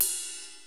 ride; Alesis HR16A

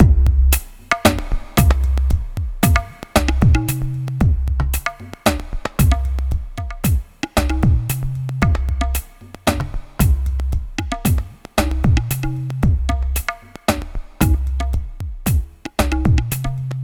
Tablatron, Processed Percussion

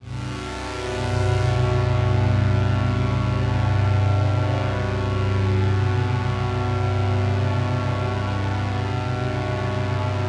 One Shot Drone C, dystopian drone; One-Shot Drones

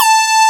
PL MOOG; Wizoo - Powered Nord; STEREO LAYER

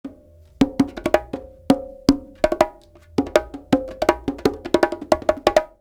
Live Perc Riffs; Jungle Frenzy 1

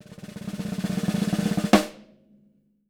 SN ROLL, SD ROLLS, Living Drums; Sampleheads; Peter Erskine

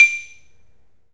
3D MARIMBA; 3D Sound; 3D Keys VOL-1

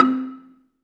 BELA2 C3, BELAFON, Asian Traditions; USB Soundscan vol.60; AKAI

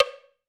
Low Muted, Alesis D4

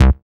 BAS, Dance Bass

